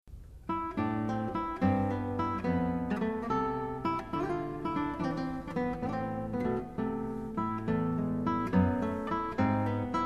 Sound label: Gigue